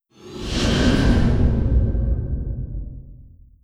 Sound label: distance assimilate fire layer; apocalypse